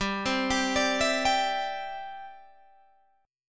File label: C-Major Add 9th G, Guitar Half Step Down